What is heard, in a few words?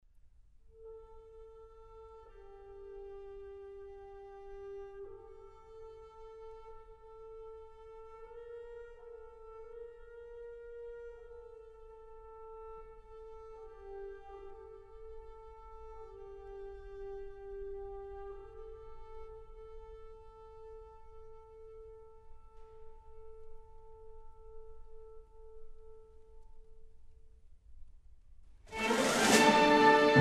Symphony in A minor; Rachmaninov; Symphony No. 3